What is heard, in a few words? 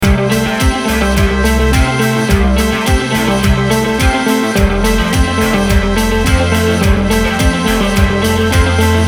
Indie Retro Loop